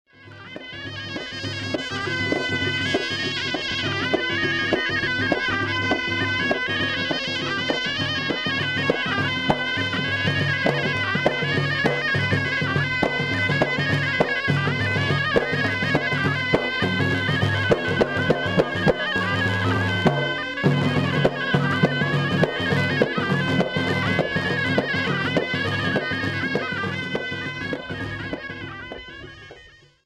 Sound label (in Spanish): Folklore tradicional marroquí; MARRUECOS